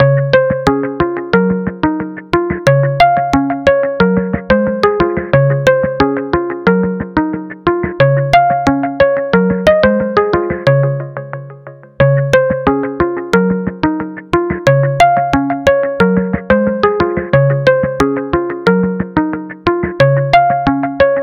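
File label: silence, realme phone sounds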